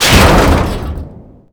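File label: debris metal medium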